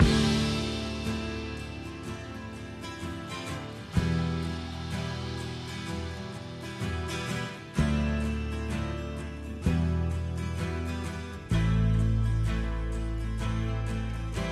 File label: guitar blue mood, Loops, Sad